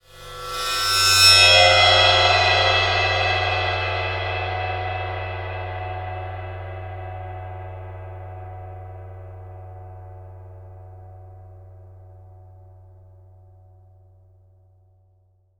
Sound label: Cymbal; Percussion Samples